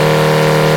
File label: Clio 2020 High